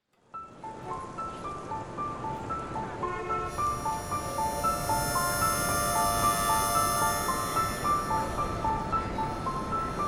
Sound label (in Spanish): armonica